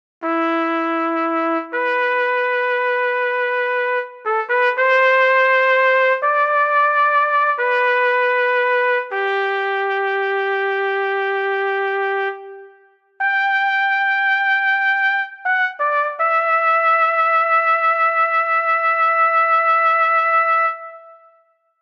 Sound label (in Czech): TROMBA s vibratem